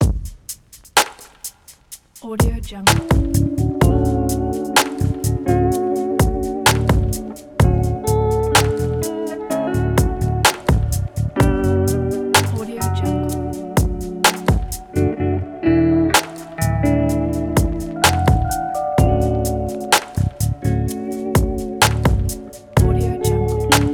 christmas lo fi

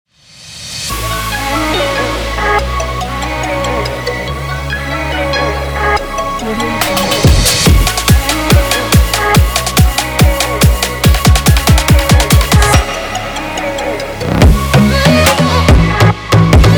fashion promo

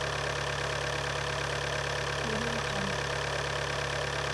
large diesel car engine idle loop

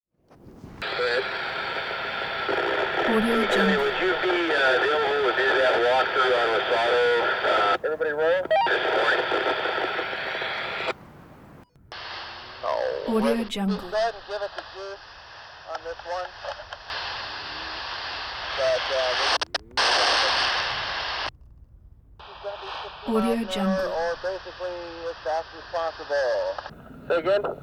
walkie talkie

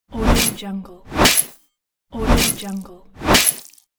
sword on chainmail armour

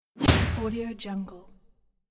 magical spell poof

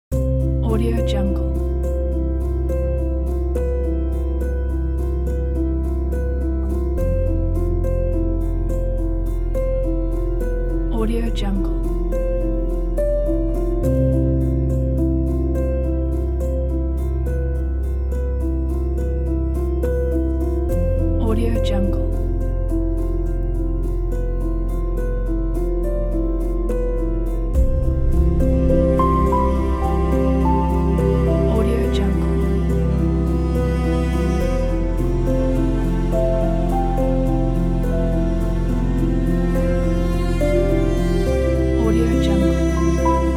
sentimental piano

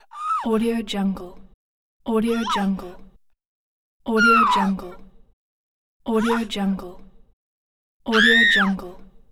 female scream

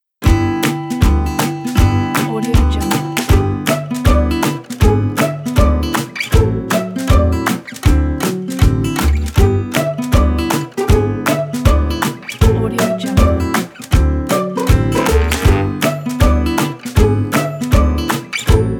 for children

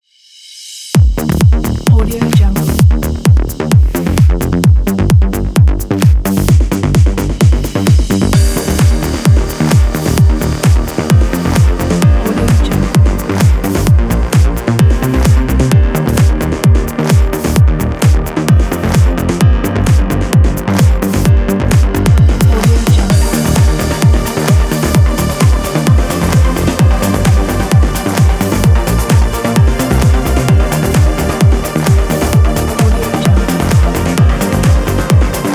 classic uplift

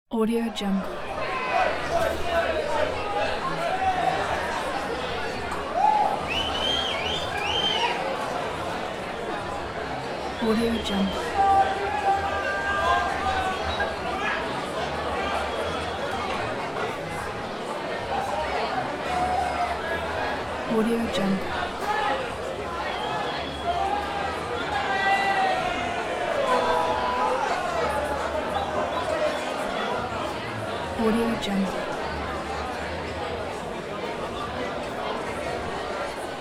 pub ambience

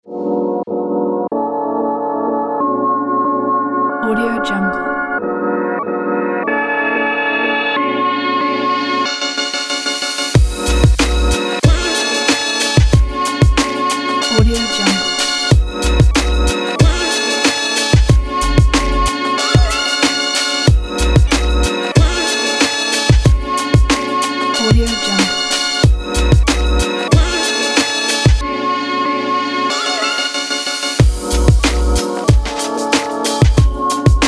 hip hop life

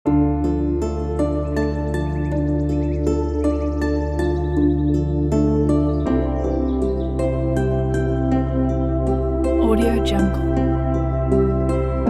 sad ambient soundscape kit

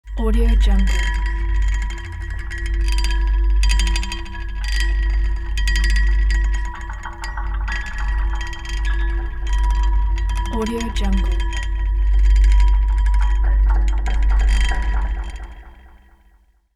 scary bells

